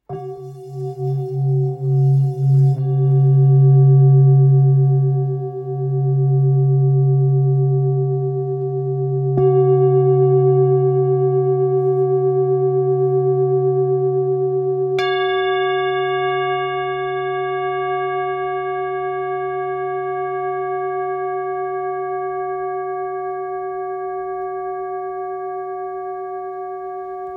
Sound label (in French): Bol ancien sol